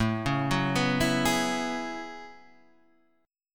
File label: F sharp Minor 11th